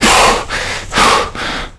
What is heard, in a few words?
player climb breaths; Call of Duty Modern Warfare